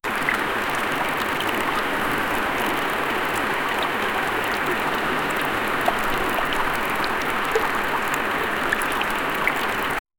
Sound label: the sound of water flowin